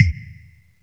REV DROP; Kawai r-50; Drums

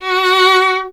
STR VLN, Vln Detache; Miroslav Vitous

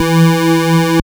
BASS, Tekno Industrial; East West

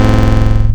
Filtered Chord, wavetables; Animoog

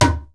IJEILU HITS; Best Service; World Colours

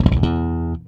Slap One Shot E, Bass; Bass X

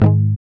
G#, Acoustic; Sound & Vision; Gigapack I CD 2; GUI ACOUST.; GUI Acoustic 1

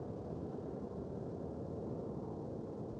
Ambience Loop, outdoor

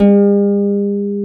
HRP C. HARP; Roland - LA Composer vol. 1

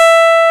CHROMA E4; E-MU Producer Series Vol. 2 – More Studio Essentials

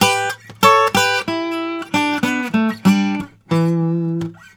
Blues Guitar lick; ILIO; Fretworks; 96 HIRUM LICK

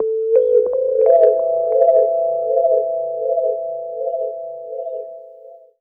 160 D min, Keyboards, Drum&Bass; Total Drum&Bass; 90 s Sample CDs

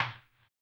HAMBONE, Vocal Blues Soul

